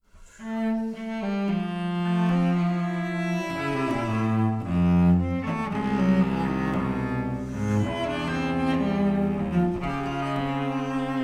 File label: cello duo